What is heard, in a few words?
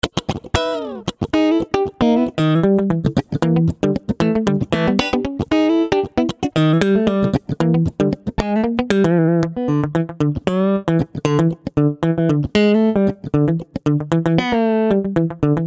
Funky Clean Solo, Jazz Guitar